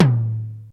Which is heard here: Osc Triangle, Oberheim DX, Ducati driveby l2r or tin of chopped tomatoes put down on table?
Oberheim DX